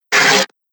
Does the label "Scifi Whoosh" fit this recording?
Yes